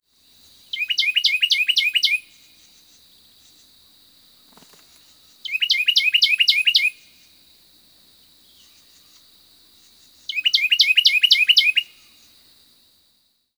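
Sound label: Carolina Wren song; FL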